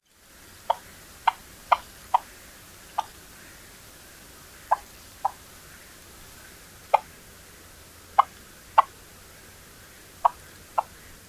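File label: whip-poor-will soft clucks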